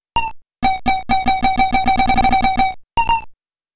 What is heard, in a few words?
Passing Through a Checkpoint